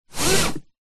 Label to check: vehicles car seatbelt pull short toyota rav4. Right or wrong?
Right